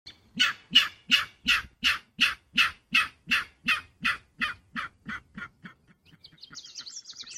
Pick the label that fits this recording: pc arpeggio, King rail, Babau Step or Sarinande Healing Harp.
King rail